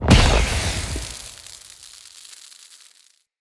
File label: Fire Push Impact; Powers; Fenn